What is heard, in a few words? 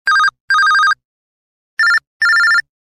Simple Beep; LG; A310